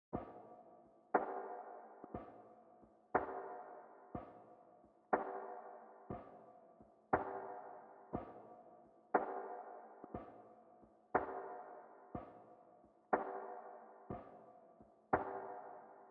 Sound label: 120 BPM G Min Percussion; Melodies; Loop Stems; Cymatics; 2022 Melody Mystery Expansion; Unfamiliar